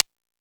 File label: Timbale, E-MU Pro-Cussion